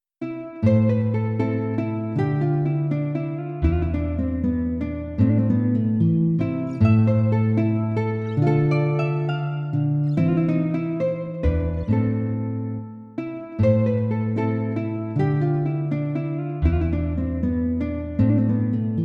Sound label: tango, Tango No.2